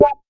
clear snare drum D key, steel snare drum tuned to the D note. Loudest frequency: 603Hz; snare drum samples